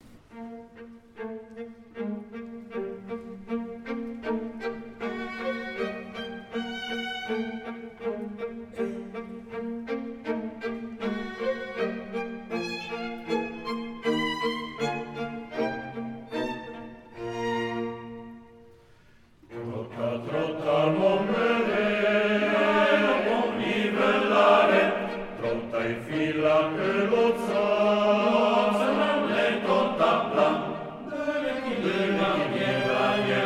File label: male Choir